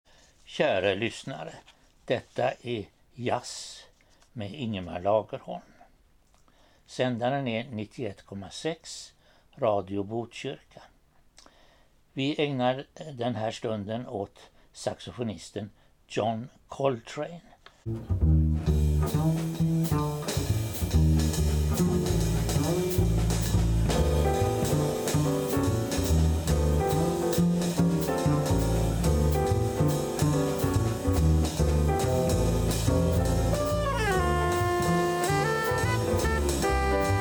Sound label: Jazz, Greensleeves